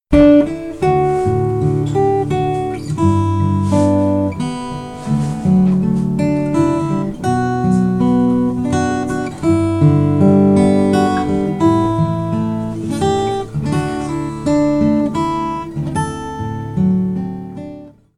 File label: Gibson